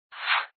闪; 创维移动+vika 音乐与声效

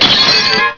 玻璃; 日常生活; 特酷声音素材库

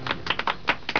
掌声, 日常生活; 特酷声音素材库

